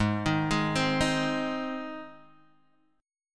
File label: G sharp Major G sharp Guitar Open G, chord